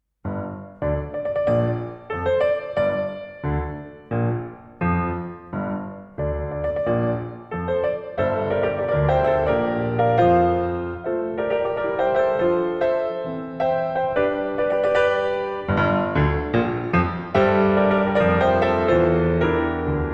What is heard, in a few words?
Fanfare; Fanfare d’ouverture